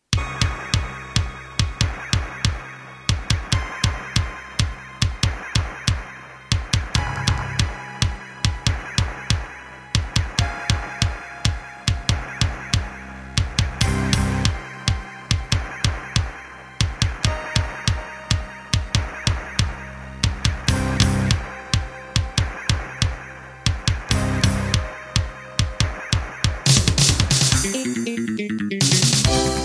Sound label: Pine PT-2030 Vivaldi Xtra Wavetable DB; sigil doom episode 5